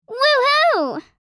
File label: Teen girl excited woo hoo; misc human sound effects